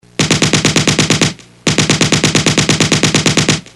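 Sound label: machine gun; army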